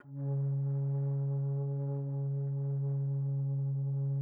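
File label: C#3, bass flute; Xsample VOL-2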